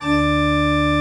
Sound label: Church Organ D 3; Best Service; Advanced Media Trax Modern Composer